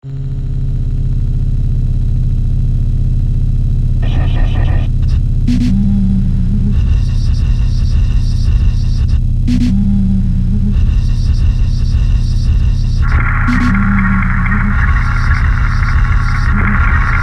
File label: HVAC; Piotr Derek